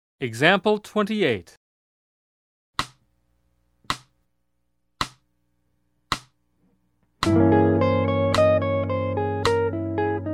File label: Jazz